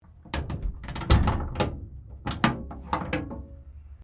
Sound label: Train Diesel TGR X Class 1951 Tasmanian Cabin Door Open Close; Bellarine Tourist railway vehicles